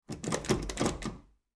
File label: Foley Door Handle Shake; Miscellaneous Doors